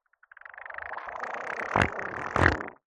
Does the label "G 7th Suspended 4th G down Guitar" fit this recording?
No